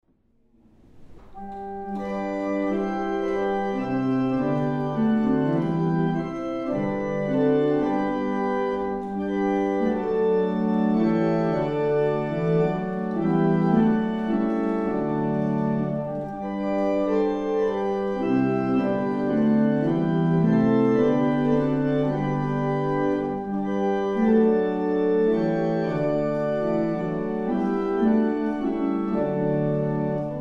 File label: barrel organ; Lopham